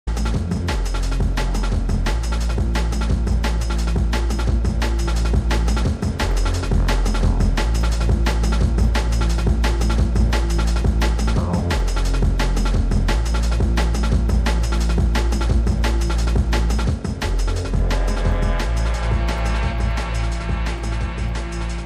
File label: Drum and Bass Jungle and Old Skool, UK Bass Radio